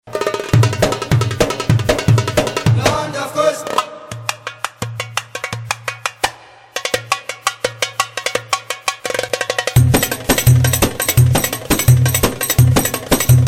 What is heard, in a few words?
rk solo; rhythm CD for teaching